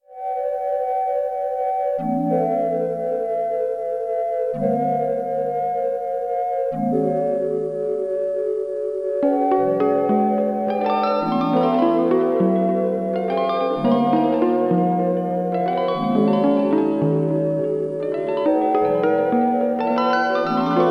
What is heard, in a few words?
Little Music with a Big Synth